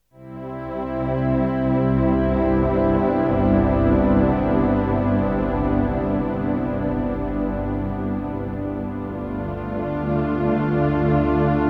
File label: Hydrasynth